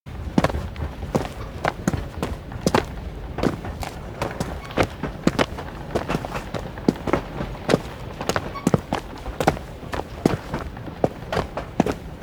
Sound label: steps outdoor blue sky market cart walk; aino; anemoi